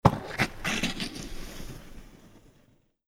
snowboard scraping ice

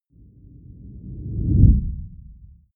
whoosh low fast

